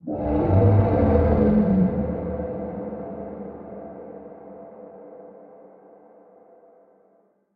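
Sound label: ambience unknown creatures kaiju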